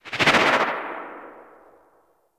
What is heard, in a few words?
Atmosphere Flyby Right; vehicles; movement; Archwing